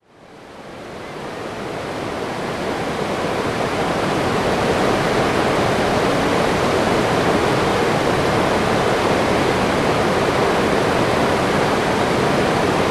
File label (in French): L Albarine; MZ-N710; Tenay